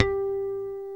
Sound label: BS, E.Bass FX; Roland L-CD701; Jazz Bass